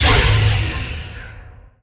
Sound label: Pickup Scifi Energy; SFX Kit